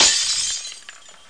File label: glas brk; Total Chaos Nukem 3d Pack; duke